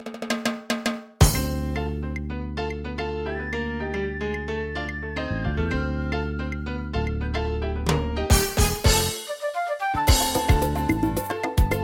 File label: Latin Pop